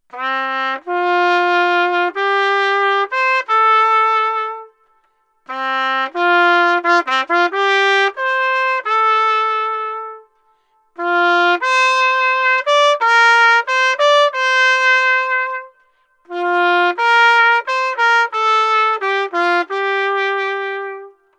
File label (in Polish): hejnał